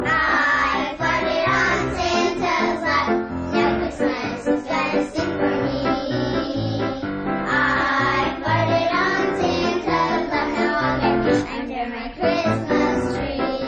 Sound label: i farted on santa